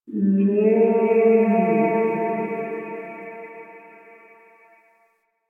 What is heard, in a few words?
Distant Creature, Ambience; One Offs; Creatures; Warframe; Quests; The New War; Drifter Camp